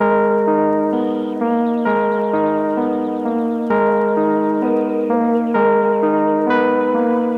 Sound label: Dark Melody Loop - 130 BPM D Min; Cymatics; Oracle Sample Pack